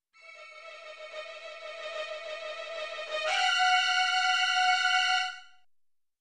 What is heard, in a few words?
Vintage horror movie tension climax